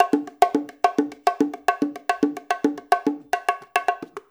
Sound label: FILL, Percussion